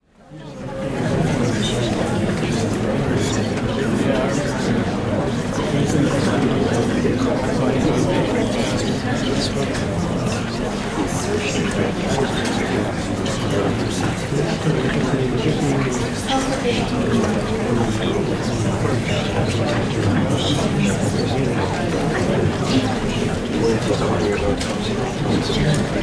Crowd Indoor Courtroom Gallery, Walla; Sound Ideas; Walla Series

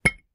Carapace Hit

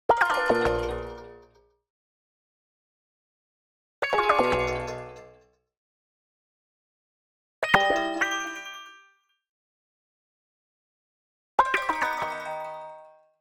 Arcade Jingle Negative

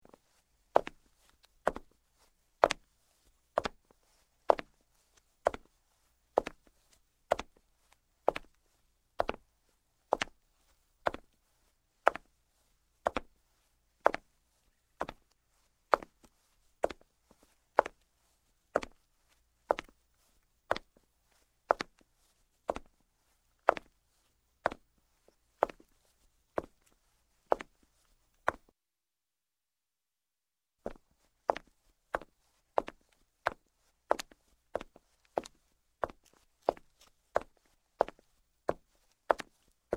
Footsteps, Stone - Male; Sound Ideas 2000 Series

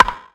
Baseball Bat Crack

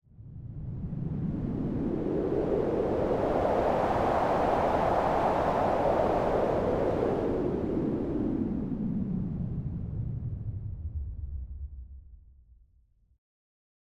movement long, artificial wind gust; boom library; Anime Essentials

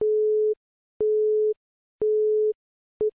phone busy signal; devices accessories utilities; NS Studios - ultra sound collection

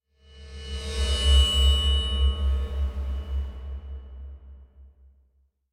Cymbal Bowed Metallic Tone Medium; Hollywood Edge; sound designer's toolkit v2

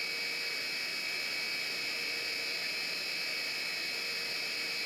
computer noise; blindside

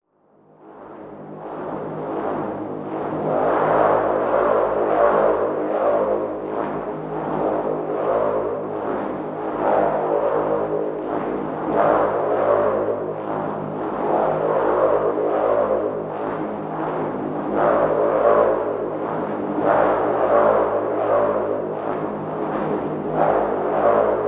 spiritcatcher G#; best service Blue Box

